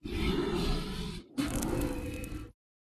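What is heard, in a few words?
Dragon Skeleton stun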